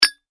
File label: impact glass bottle hard chink, Impact-Glass Bottle (Doesn’T Break); Impact - Glass Bottle ' Chink ' Without Breaking; Materials; McKinney Sound